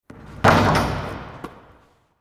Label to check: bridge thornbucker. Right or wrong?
Wrong